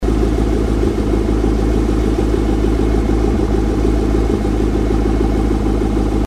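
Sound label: corsa exterior idle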